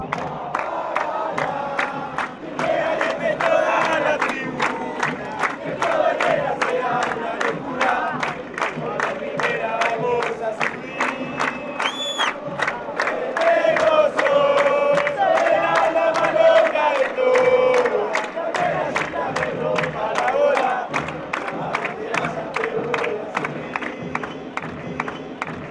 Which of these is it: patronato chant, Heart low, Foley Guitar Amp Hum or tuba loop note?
patronato chant